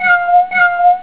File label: cat